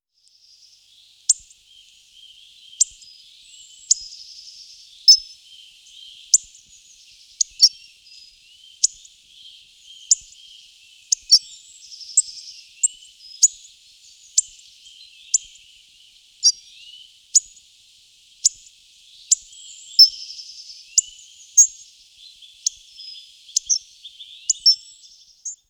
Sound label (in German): kernbeisser, singvoegel gesang; roche 2004